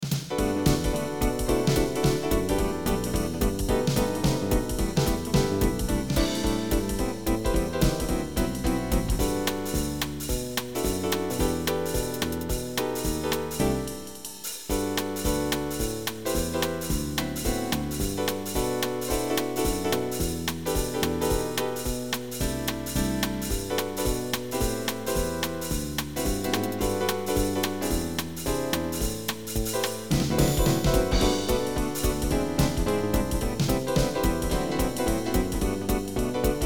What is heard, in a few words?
jazz, multisample midi music